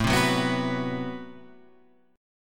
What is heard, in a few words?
A Minor 6th Add 9th A Guitar Open F; Am6add9 strummed; 9,7,6,6,9,6; JGuitar